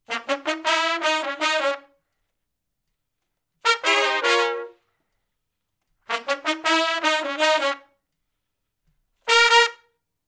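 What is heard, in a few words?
R&B Riff (Bbmin) unison to harmony, Horncraft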